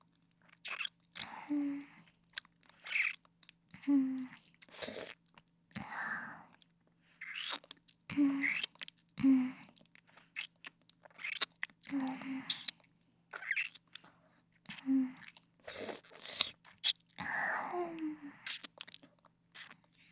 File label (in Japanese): フェラ音